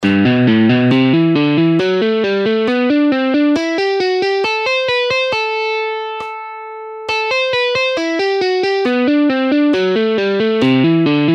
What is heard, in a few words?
Exercise 3 In Half Speed, guitar warm-up exercises